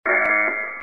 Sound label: Alarm